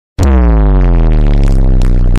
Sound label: Bass Boosted